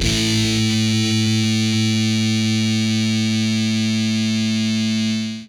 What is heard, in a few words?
POW CD FUZZ, Guitar; Quantum Leap